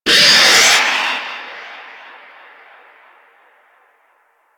Infested Devourer Retract Vocal B; Warframe

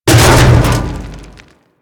Storage Container Smash; Objects; Grineer; Warframe

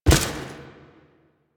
Step Default, Footsteps; Foley

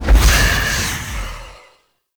Wraith Leap Jump; Enemies; Warframe; Zariman

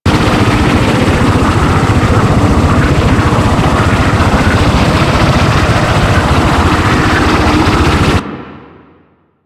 In Flight Loop; Warframe; Liset; Hub

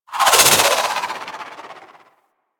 Sidearm Charged Proj; Weapons; Warframe; Tenno; Wraith

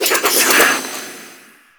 Kunai Reload; Warframe; Weapons; Choir

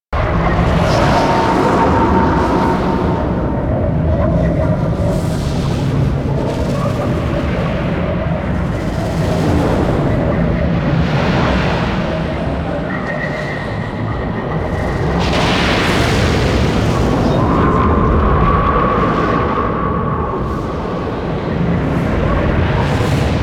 Exterior Combat Background Amb Loop; Ambience; Warframe; Duviri

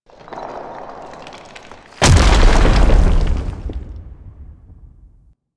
Rock Fall Close; Warframe; The New War; Lua War Room

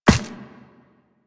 Walk Ice, footsteps; Warframe; Operator

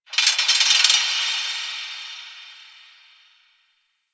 Fortress Chains Small; Ambience; Warframe; Grineer Fortress

